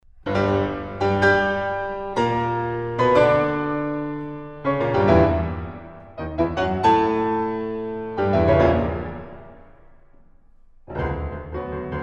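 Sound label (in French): sonate en si mineur, piano; harmonies poetiques et religieuse; liszt; francois frederic guy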